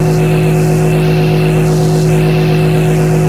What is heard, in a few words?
Imager Nexus Loop